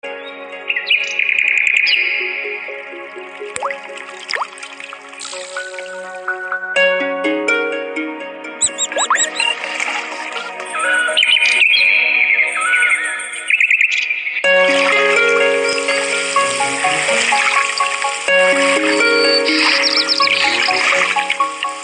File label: nature birds background music tone